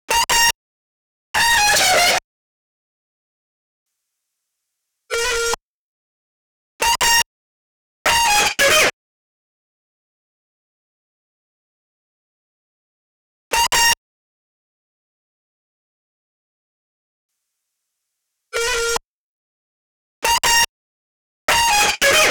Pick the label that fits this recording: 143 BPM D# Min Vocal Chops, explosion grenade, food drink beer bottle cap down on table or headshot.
143 BPM D# Min Vocal Chops